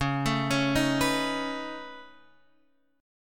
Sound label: C sharp 7th Suspended 2nd Sharp 5th, C sharp, down, Guitar, Drop D